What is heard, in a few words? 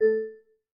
Class Harp